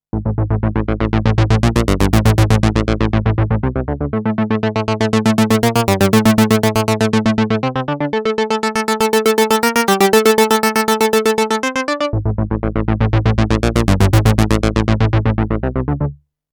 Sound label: synth